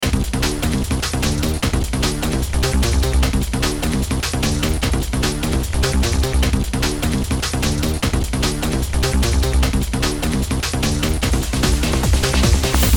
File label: Fight; Serious Sam 2